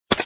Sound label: btn Click; chess